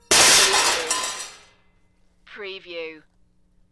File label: Metal Medium Impact Settle